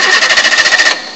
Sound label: CAR START; EFFECTS; M8; CMI Factory